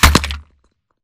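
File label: Bullet Light Ballistic Concrete impact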